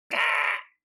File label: Goblin Yell